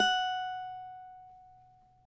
CAVAQUINHO F#, Guitars; Spectrasonics; Hans Zimmer Guitars VOL-2

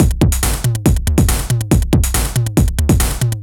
retro drum machine Loops 140bpm; Tape A

